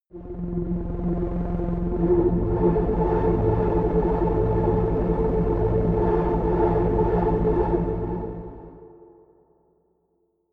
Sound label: drone hovering; musikelemente; drones